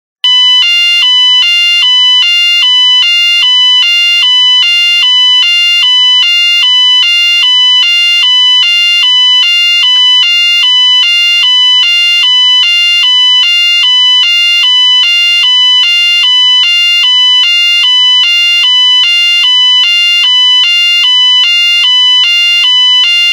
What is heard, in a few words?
two tone 1khz 700hz, sirene; DP3000